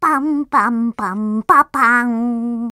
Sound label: startup winxp